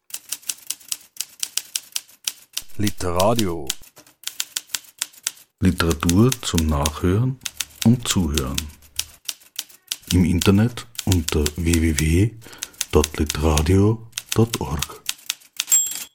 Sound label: Jingle